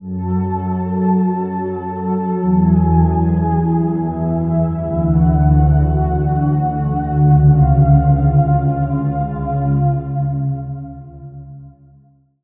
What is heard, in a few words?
Pad; Synthetic Spectrum; Routed Sound; Spire Expansion; Reality Maker Vol.1